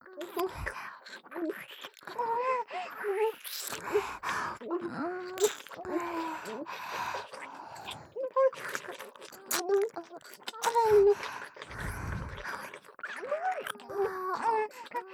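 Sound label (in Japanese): イラマチオ＋片耳舐め; 小春; 美冬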